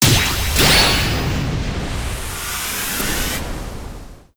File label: Team Skill Cutin Good; disney star smash android ios mobile gamerip 2020